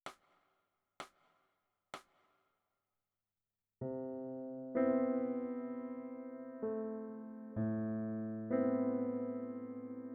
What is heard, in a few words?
Piano